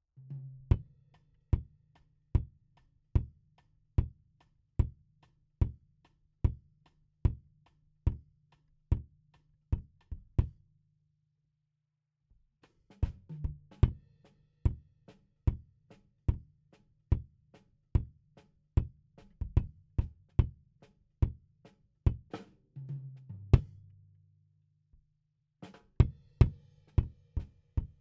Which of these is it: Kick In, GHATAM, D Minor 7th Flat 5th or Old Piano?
Kick In